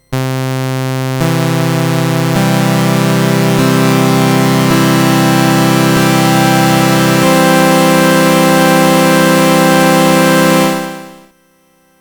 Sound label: SID MOS6581 SAW 6 Oscillators